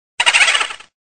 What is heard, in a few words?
laugh funny ringtone